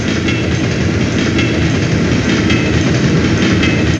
Train; Sound Bar; Midisoft

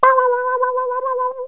voc warb, cartoon; Wired for Sound Pro CD; Aristosoft, Inc.

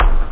bass drum; drums

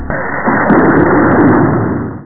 lightning; NEMESES

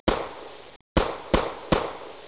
pistol; 10,000 Graphics Pack Volume 1; Media Graphics International

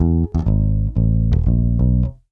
bass loop, Rock

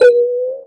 BLEEP, Gadgets; XCOM

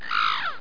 squawck; landslor

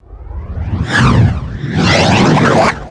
roll; PC Gamer IT CD 48; trickstyle

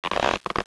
Stretch; Princess and the Pea; SoftKey

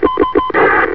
radio beep; specops

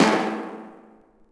land tile; ambient

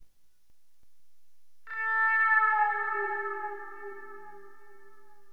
MIAOW, Guitar; LiveAct Pool

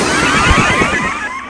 death ray; toyspace

